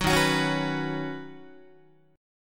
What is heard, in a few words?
D sharp Minor 7th Sharp 5th, Guitar; D#m7#5 strummed; 9,11,9,11,10,10; 4A,4E,5A,5D,5G#,6C#